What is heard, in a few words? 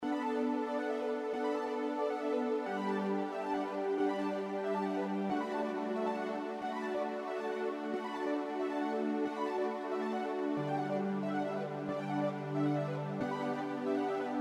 Christmas Stars Arp